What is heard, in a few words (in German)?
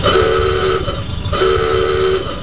ahorn